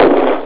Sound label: glong; Amiga Soundtracker sample